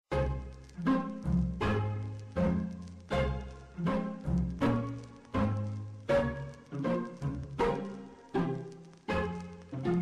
instrumental slowed

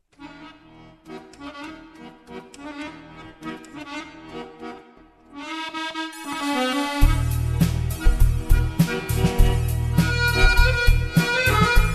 slowed reverb

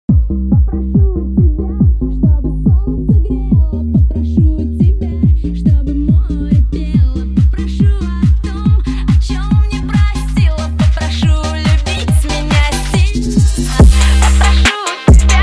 phonk remix

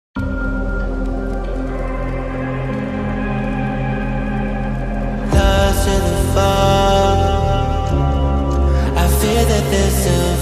guitar remix reverb

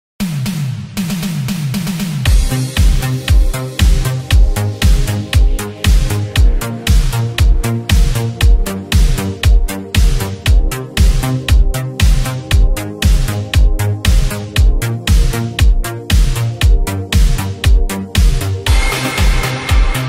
new italo disco euro

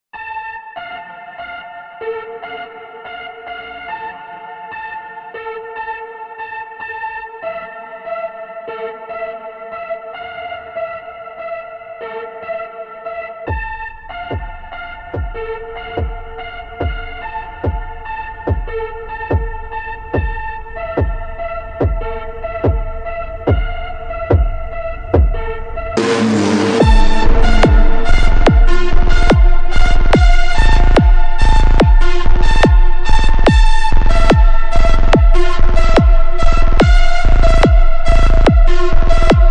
super slowed

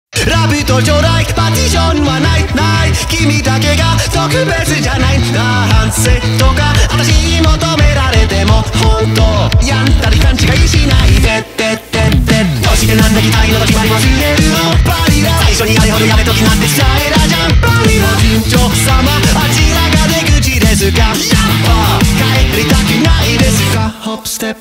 metal cover

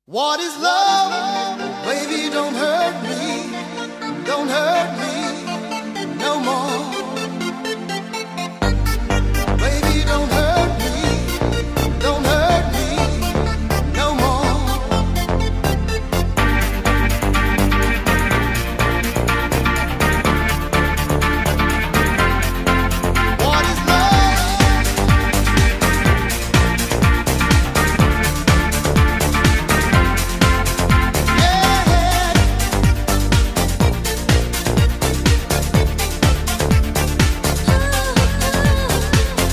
shuffle dance music

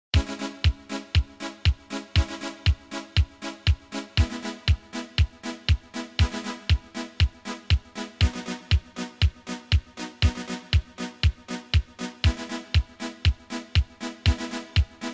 instrumental italo disco

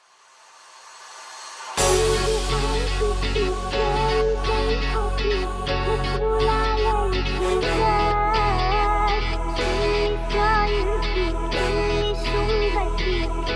arabic remix

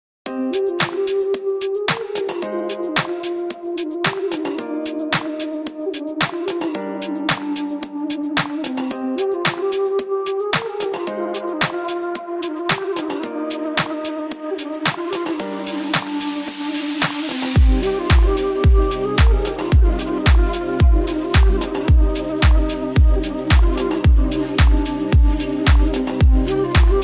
bass remix

